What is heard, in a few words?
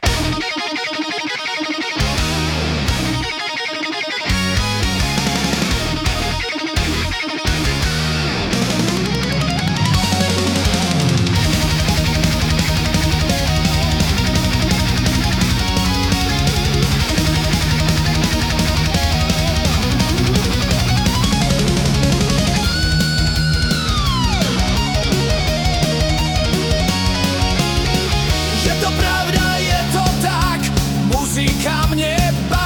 Metal